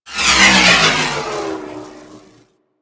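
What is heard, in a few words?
Space Fighter Projectile Whiz By; Space Fighter Gox Agent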